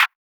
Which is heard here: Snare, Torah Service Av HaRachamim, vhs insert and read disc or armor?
Snare